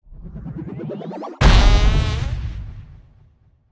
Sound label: aura disappear